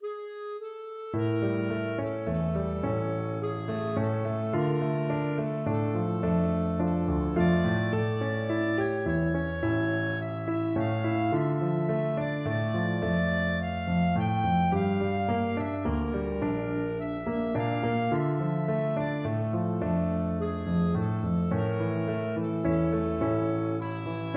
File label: dark eyes, clarinet